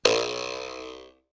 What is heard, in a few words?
spring